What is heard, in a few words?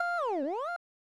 GOAL Wipe; SMW